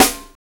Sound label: TOON SNARE, drums